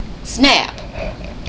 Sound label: Snap